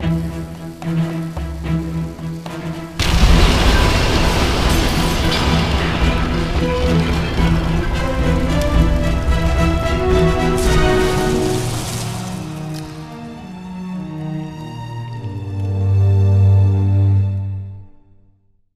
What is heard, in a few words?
Explosion; Secret Files 3; Android